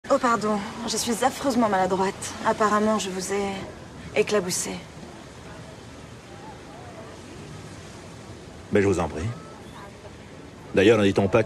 reveil

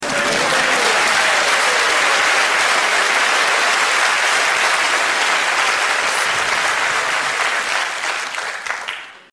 applaudissements

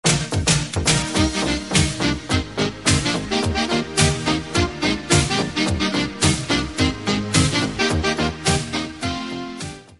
generique top50

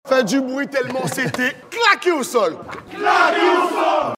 claque au sol

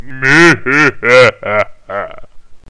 rire malefique

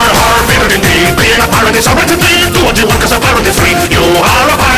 en moins bien; pirate des caraibes

